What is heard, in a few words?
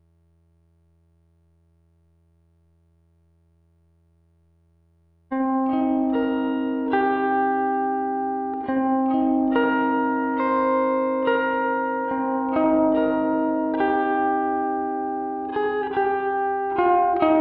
gtr texture